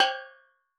Steel Drums Layer G5, Calypso Steel Drum; Percussion

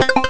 mega hip hop; Amiga Soundtracker Sample Packs

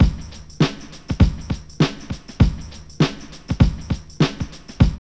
TAMB LOOP; Zero-G; Funky Elements

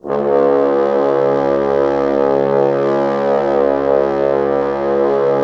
Orchestral Brass

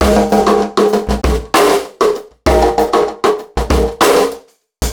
Beat, Processed Drumkits; Event Horizon

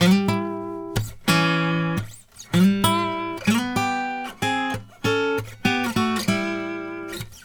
Blues Guitar Samples

